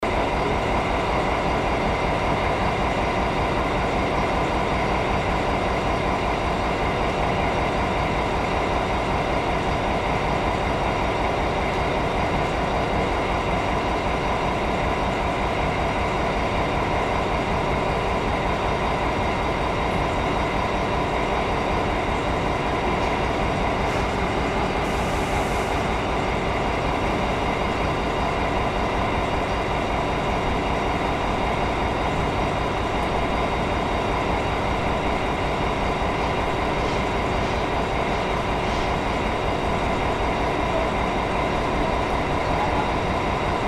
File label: BB 67616; mz-n710; clermont-ferrand